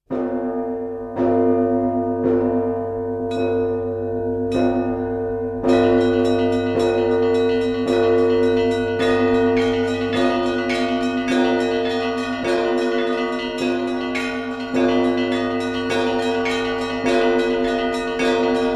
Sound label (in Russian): trezvon